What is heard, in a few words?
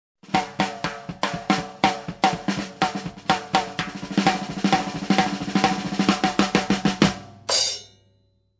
Long jazzy drum fill